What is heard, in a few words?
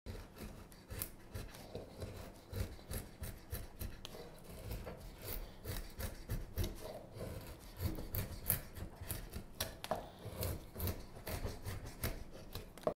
Mosaic; معرق کاری